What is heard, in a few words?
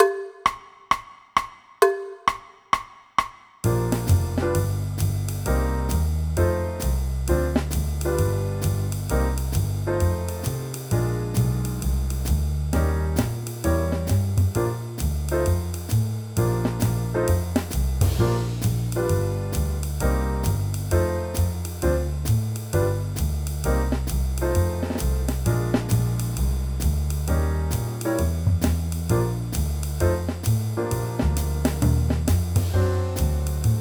Senior Vibes Playalong